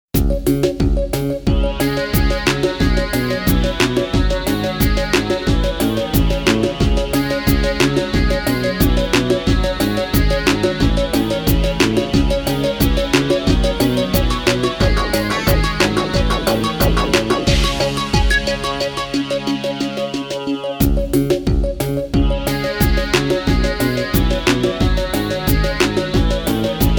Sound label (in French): VERSION KARAOKE; Alex et Zoé 1